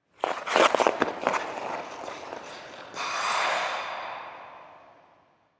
Ice Skate Start, Ice Skate Start Away Run Stop Snow Plow; SoundEffects-Blastwave; Sports